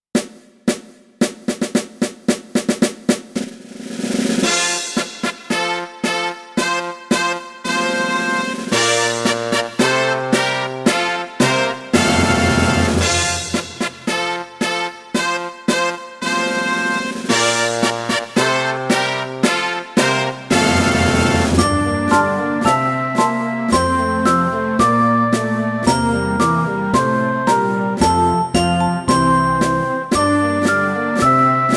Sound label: Fanfare Opener F